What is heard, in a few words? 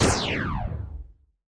fruit Shoot; feed the frog